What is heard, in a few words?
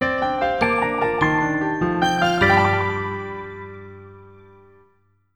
Jingle Body Measure End; wii fit u